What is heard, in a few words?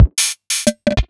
Yamaha PS-3